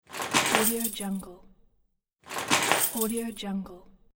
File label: take the keys; bluepaw